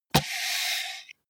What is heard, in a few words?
computer mouse draw drop